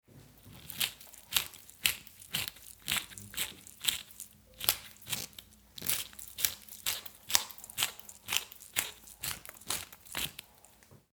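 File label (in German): Pfeffermühle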